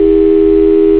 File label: phone dial tone